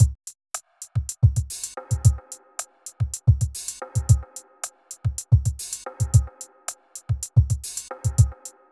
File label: r n b kit 110